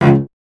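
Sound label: strings cello C#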